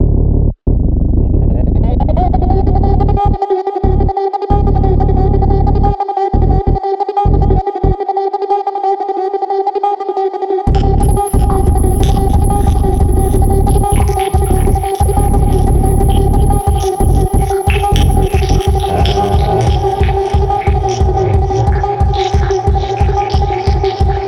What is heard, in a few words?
hi tek rave goa